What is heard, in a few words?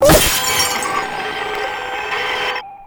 Screen Blast